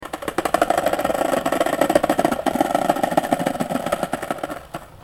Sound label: skateboard